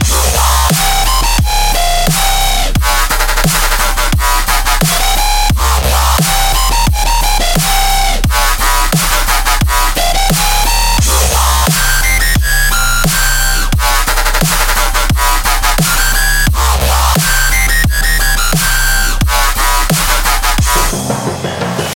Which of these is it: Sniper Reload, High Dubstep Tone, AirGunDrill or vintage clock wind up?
High Dubstep Tone